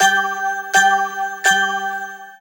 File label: BATT V LOW